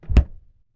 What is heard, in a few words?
Refrigerator close